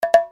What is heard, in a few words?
bongos